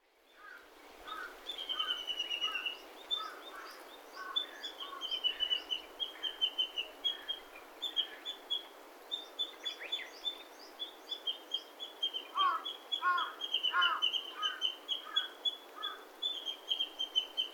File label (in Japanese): uguisu, saitama